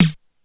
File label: Snare, Korg DSS-1 Drums